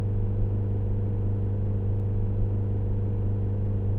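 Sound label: Toyota RAV4 II 2.0 16V Diesel Engine - Interior - 3k RPM loop stereo; Soundholder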